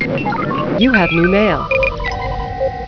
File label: new mail